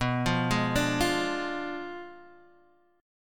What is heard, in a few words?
B Diminished 7th B Guitar Half Step Down